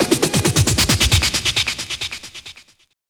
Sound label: drumbreak, 130